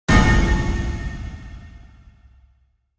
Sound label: Impact Heavy Metalli